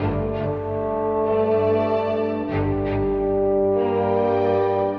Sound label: strings sting, Sad; Loops